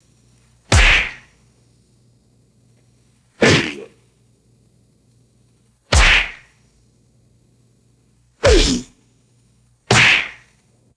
three sharp theatrical backhand slaps

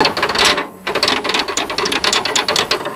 loose metal crank rattling and squeaking

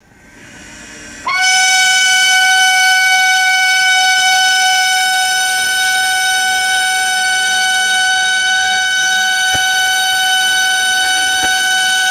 loud continuous factory hooter sounding; whistles

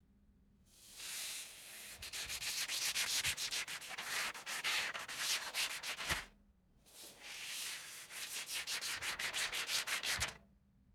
continuous smooth paper tube rubbing

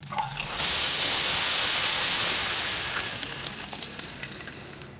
water poured onto hot pan sizzling

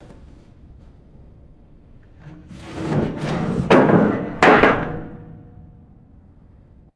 heavy metal slide and dull resonant thud

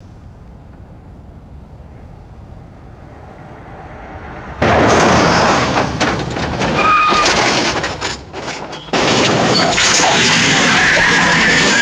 vehicle crash with long tire squeal; destruction

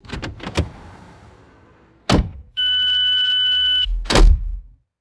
vehicle door opens and shuts with warning beep

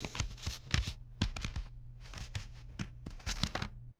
deck of playing cards being cut; foley

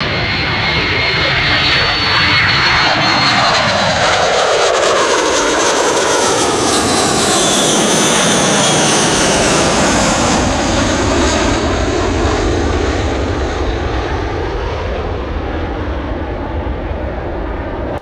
commercial jet aircraft flying overhead

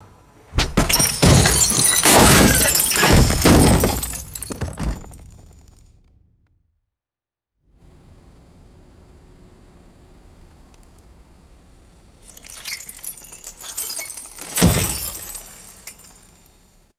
repeated heavy impacts shattering safety glass

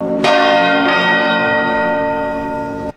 single strike of a church bell; bells

